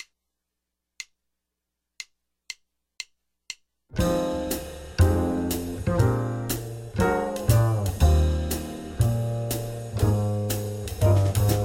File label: (Cm) 8 Bars Loop